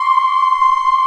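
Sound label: C5 child ah right, Choir; USB Soundscan vol.28; Acoustic & Synth; AKAI; CHILD AHF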